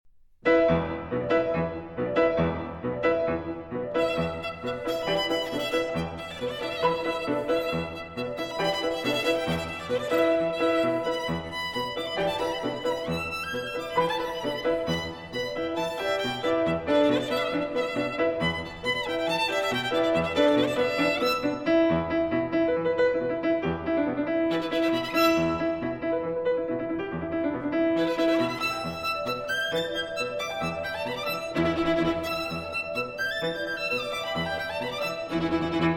sonata for violin and piano; walter kaufmann; sonata n 2 for violin and piano; 3e mvt

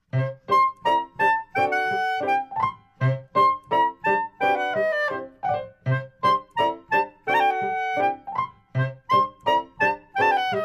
nigun joyeux

